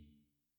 Yeti

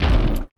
new wolf walk

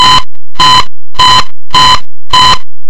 alert

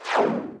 Missile Hit

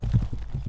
horse step

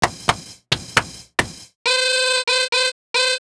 handclap

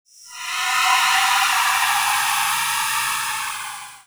Cursed King

